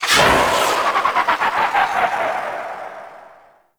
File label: Archwing Healer Engine Boost Wind Down, Vehicles; Warframe